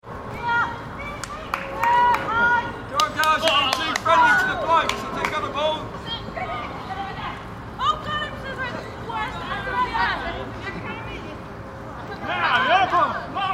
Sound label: Park Sports, Men and women playing team games in a park.; Miscellaneous Sports (Sound Effects:Sports)